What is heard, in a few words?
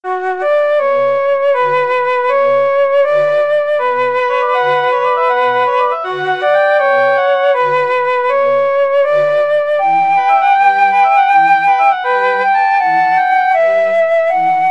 forza, mixed ensemble